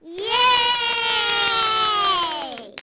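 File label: kids yay; Microsoft Graphics Studio; Home Publishing 99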